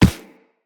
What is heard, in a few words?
Run Default, Footsteps; Characters; Operator